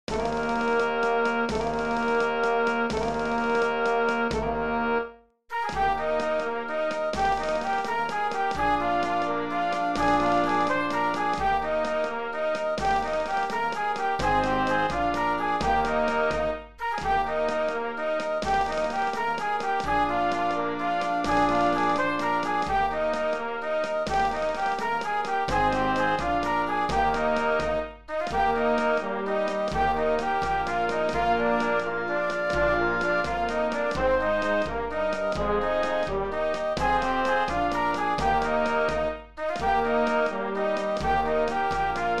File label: the irish washerwoman playback